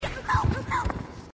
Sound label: weird grunt sound